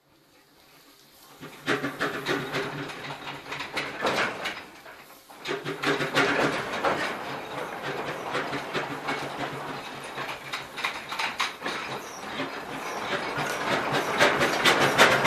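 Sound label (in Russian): парового трактора в сарае трактор заезжает в сарай останавливается глушится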